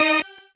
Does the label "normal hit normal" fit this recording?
Yes